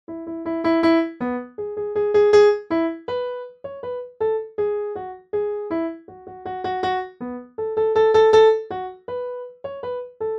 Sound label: Bright Dry